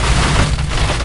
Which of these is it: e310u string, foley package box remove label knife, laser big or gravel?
gravel